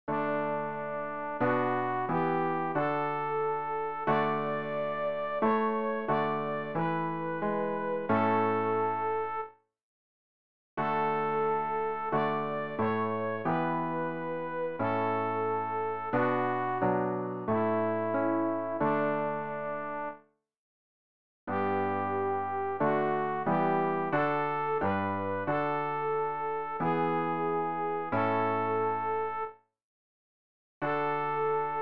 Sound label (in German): sopran; Ref. Gesangbuch; RG 627: Wir danken Gott